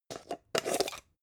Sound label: jar plastic midsize open short